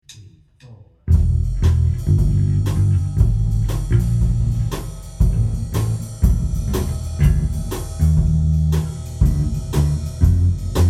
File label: Bass; rockbandacademy